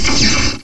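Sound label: electric shot; elecshot; Ferazel's Wand Demo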